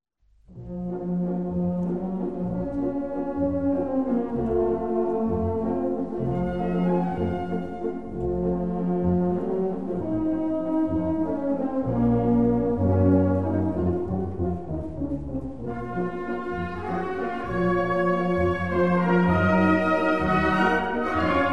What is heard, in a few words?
waves waltz; amur